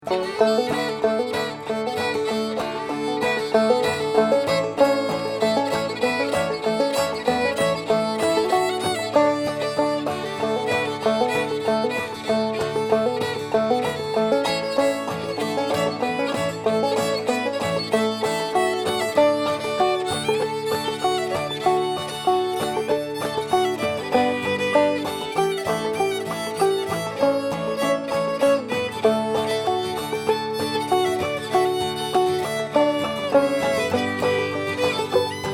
ragtime basic

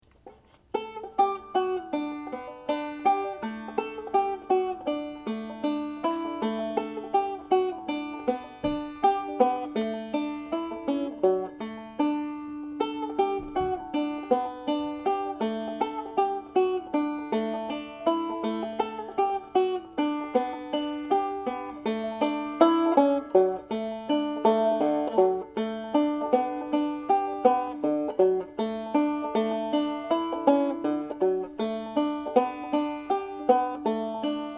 needlecase melody

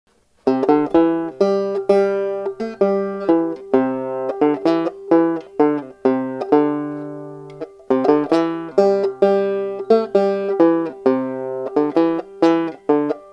susanna melody

